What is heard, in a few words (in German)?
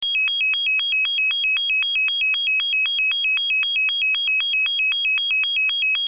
ton, Signalgeraete